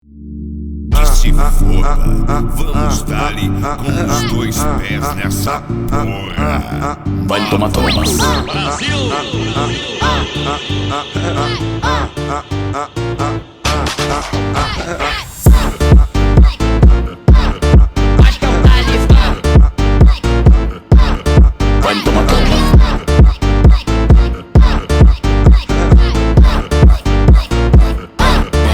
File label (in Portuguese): automotivo phonk